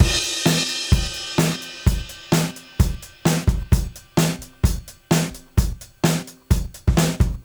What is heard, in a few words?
129, Beat Loops; Zero-G; Monster Beats